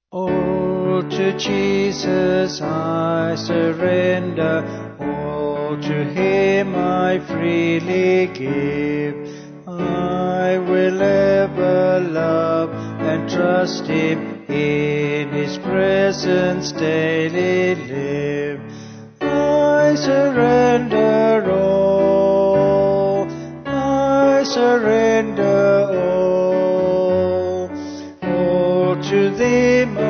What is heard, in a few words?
Vocal Piano; All To Jesus I Surrender